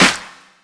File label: short reverb clap single shot f sharp key